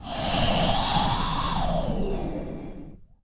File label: magic wind